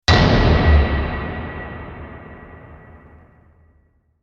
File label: stab hit big